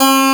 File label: Harpsichord E2